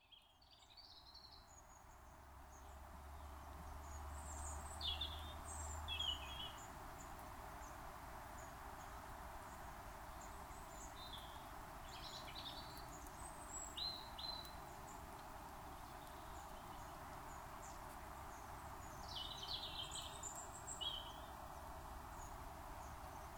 Tennant Canal Soundscape

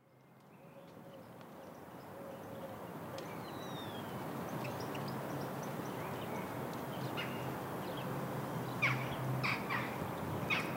Garden Soundscape

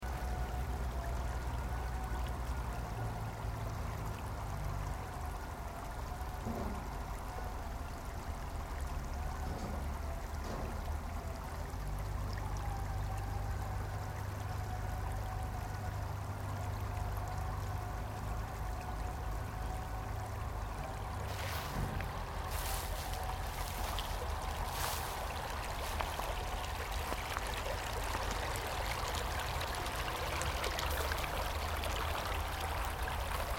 industry and water; Penclawdd

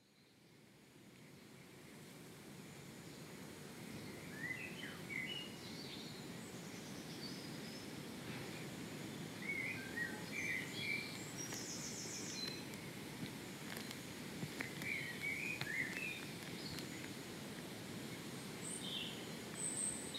Gorseinon Walk Soundscape

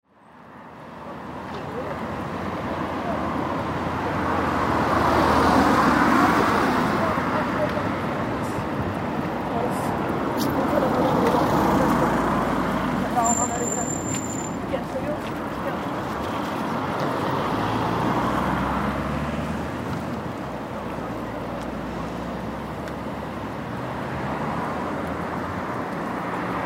Colwyn Bay

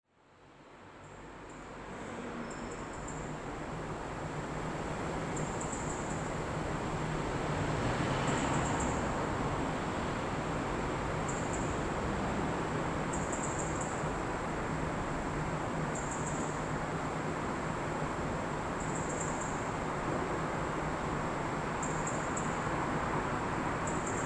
Multi storey bird

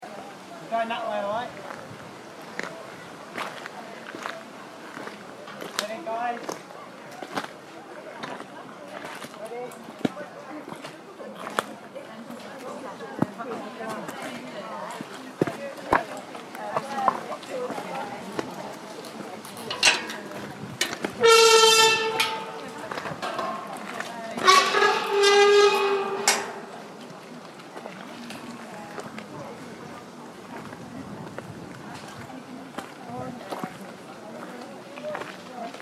quietening ambience; Gateway

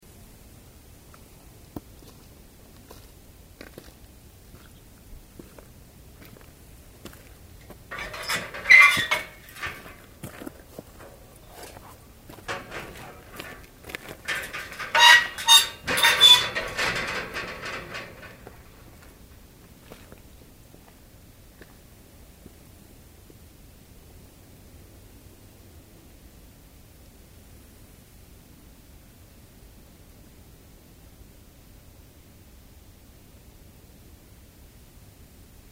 Gate

